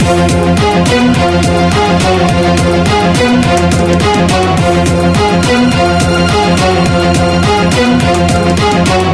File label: fever BGM boss